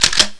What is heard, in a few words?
grab Ammo; CD Action